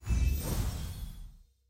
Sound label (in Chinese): 商城 专场; nba